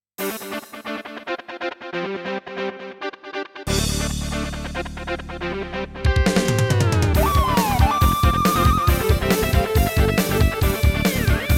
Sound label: SC880; the incredible machine 2